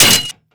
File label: metal slash; Fate The Traitor Soul